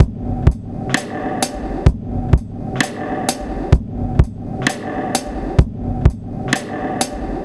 Drum Break, Trip-Hop Loops; Sonic Foundry; Rondo Brothers Trip-Hop Loops & Samples; Hooked On Hookers

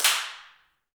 handclaps, Heart of Africa

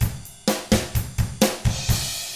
127 SURF ROCK

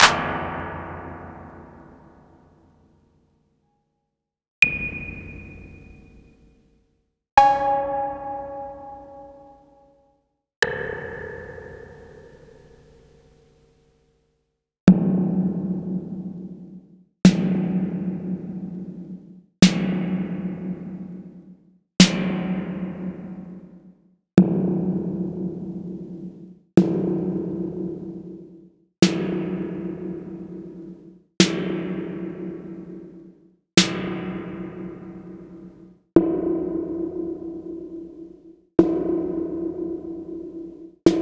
Spring808

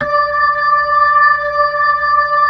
B-3 Chorus

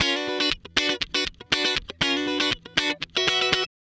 Guitar Licks

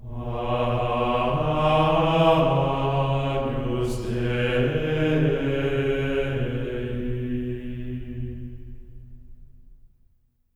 AGNUS B3, Symphony of Voices; Spectrasonics; GR AGNUS

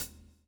SWNG

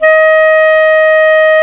CLAR, Clar Jazz; clarinet; Roland L-CDX-03 Disk 1; Clarinets

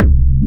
Whooping Out, Kick Drums; Zero-G; Soundclash

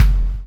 CHAIN; Spectrasonics - Backbeat

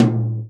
tom, Real Drum Kit, jungle kit

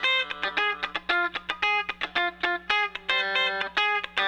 Raw Funk Guitar

